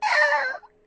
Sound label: Poison Moan, voices, Pirate; hedgewars